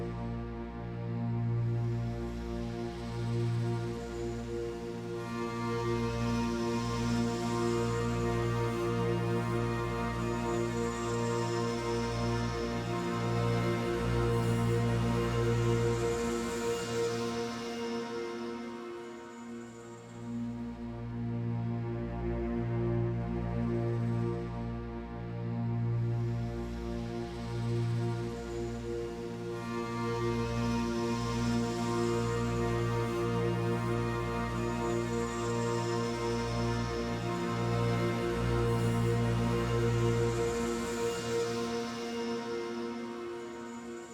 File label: Minigame Looping Cue; Tuvalkane Forge